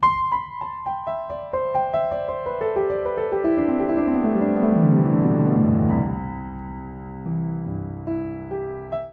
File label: Phrase Flourish C Major 105 bpm, Upright Piano; Upright Piano by Spitfire Audio; Ableton; Factory Packs